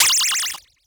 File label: Multimedia Internet CD-Rom Flash Animation Cartoon